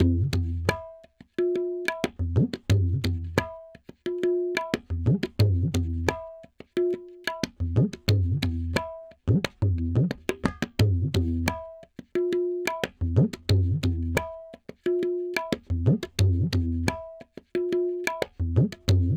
tabla 089, Hip Hop India 089bpm; Hip Hop India Modern Percussion

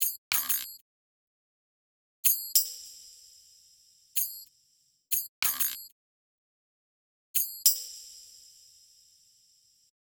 Percussion, Hip Hop Exotica, 094 Emin; Apple Loops; Big Fish Audio

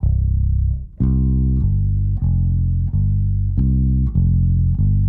Bass, 094 Cmin; Hip Hop

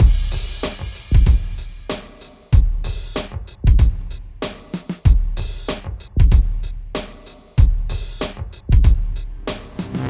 Drums, 095 Cmin

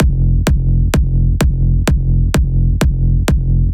Big EDM Kick Loop, 128 BPM; Future House Tools

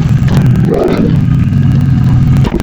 Cougar, Wavetables; Cymatics; Serum; Animals for Serum - Gold Edition

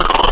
Chipmunk, Wavetables; Cymatics; Serum; Animals for Serum - Gold Edition

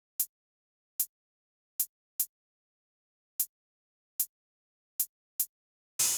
Hats, 075 Bmin, Drum Tracks

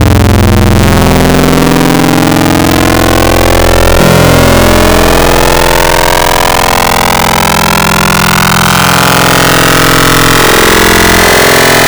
FM Table, FM Wavetables; Cymatics; SERUM

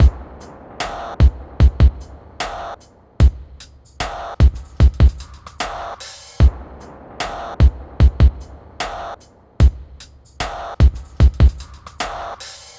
Drums, 075 Dmin

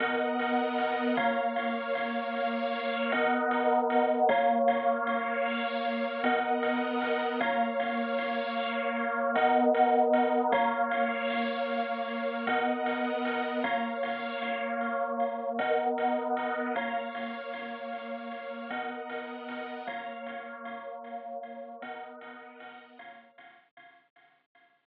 77 BPM B Min Chords; Cymatics; Destiny Gold Expansion; Light It Up

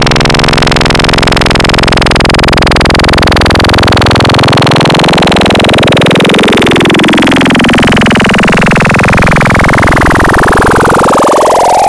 Log Spectral, Wavetables; Cymatics; Wavetables Collection Vol 3; Serum

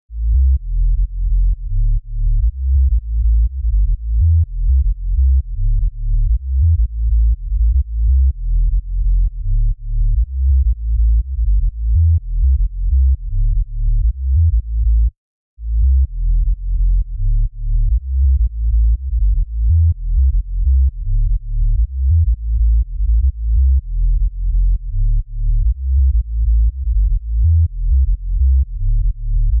Sub Bass, 124 BPM A; Future House; Big EDM; Future House Tools; Construction Kits; Cracker

